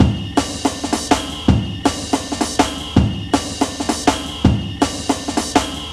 162, breaks; M8

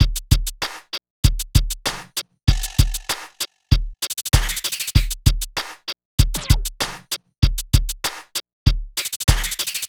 Beat

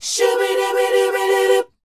SHOO B A, R&B Dance, vocal; Spectrasonics Vocal Planet

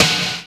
Akai XR10 Snare; Drums

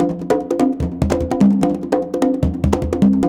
CONGA BEAT; Zero G - Ethnic; CONGA BEATS

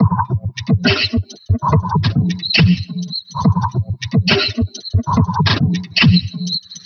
Vinyl Loop; Sonic Foundry (Sony Creative Software); Esoterik Beatz; Vinyl101

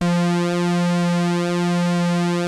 SAW LEAD; Kid Nepro; Elite

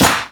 clap, hip; Best Service; Dance Mega Drums

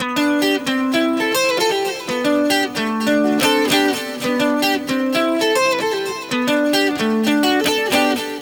TWNSHIP, S.AFR.GTR; Spectrasonics; Heart of Africa 2

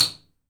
TOY HAM., TOYS PERCUSSION

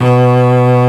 solo cello; East West; Global Sounds

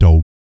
PERC, Vocal, Jazz FX, BASS PERC

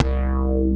BASS, 170 BPM; Ueberschall; Drum & Bass Flash Trackz

